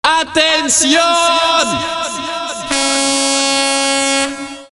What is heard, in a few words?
Attenzione Hoooorn